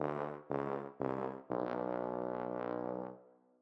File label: fanfare sad